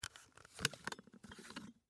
Thermos Unscrew, Foley, Thermos, Lid, Unscrew, Open; Lid Sound Effects; Lids (Sound Effects:Objects); BlastWave